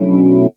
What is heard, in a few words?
Chords Tones; Equipped Music; Loungin House